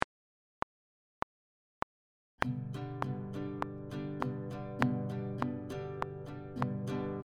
Easy strumming pattern, guitar; Strumming pattern 4; Strumming pattern 4 audio

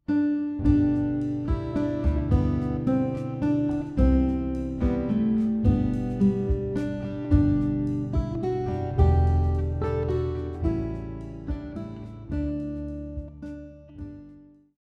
guitar; We Gather Together

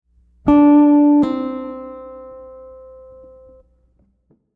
pull off example, guitar; hammer ons and pull offs on guitar

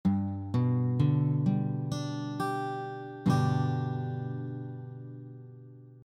G Major, guitar chords; G Major chord basic; master the basic chords on guitar a beginners guide

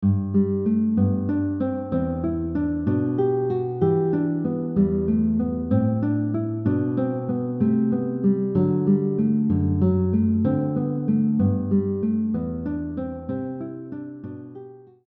guitar; Jesu Joy of Mans Desiring; classical guitar

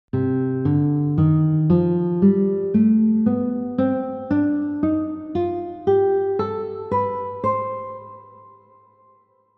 C Melodic minor scale, guitar

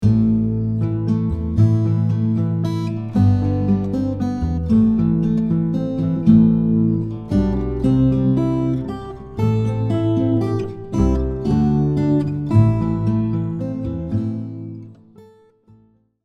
guitar; Scarborough Fair; popular songs on guitar

